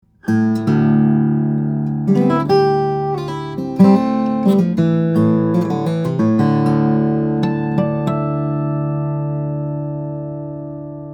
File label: guitars; Olson SJ; brazilian rosewood; cedar; steel string guitars; flattop